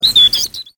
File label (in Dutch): roodborst